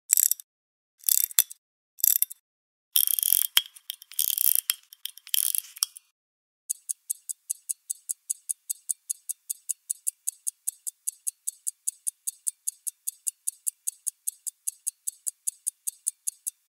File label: pocket watch